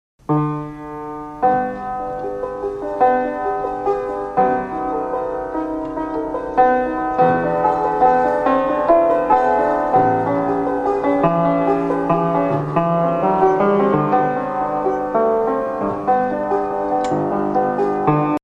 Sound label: square piano; collard and collard